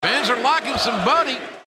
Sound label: chant